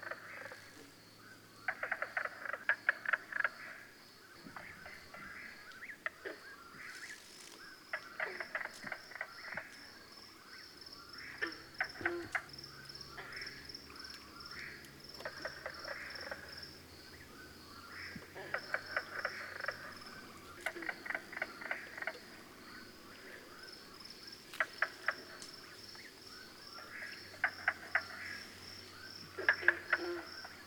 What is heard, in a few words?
mink frog, frog calls; amphibians; terrestrial